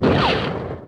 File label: Missile